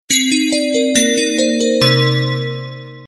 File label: Mystery message tone